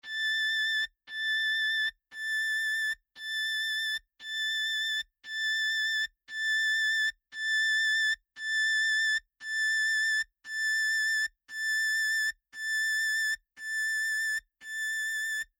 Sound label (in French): Violon